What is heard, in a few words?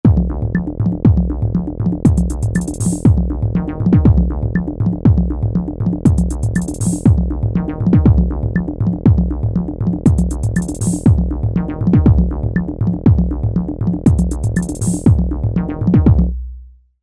Ambient